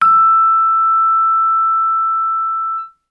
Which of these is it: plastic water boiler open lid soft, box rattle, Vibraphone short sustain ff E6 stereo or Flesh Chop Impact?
Vibraphone short sustain ff E6 stereo